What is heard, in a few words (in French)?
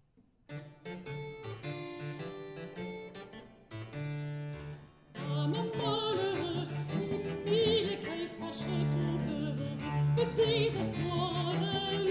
soprano bc; baroque; amants malheureux; Alcione